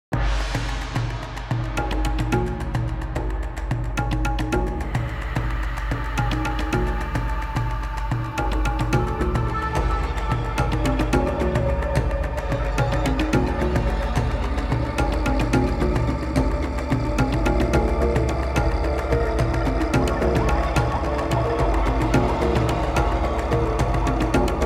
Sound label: Cave Mini Boss mix; children of morta